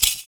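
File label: PERC, percussions; Univers Sons - Raricussions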